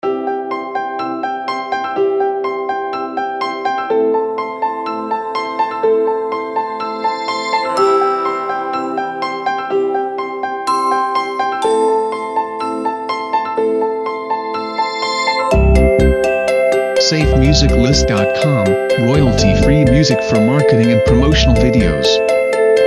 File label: Bright Morning Sunshine